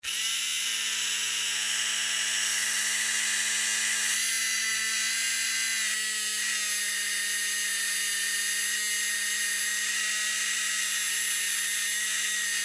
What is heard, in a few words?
Electric Motor Small Run Long Struggles; Machines; Big Room Sound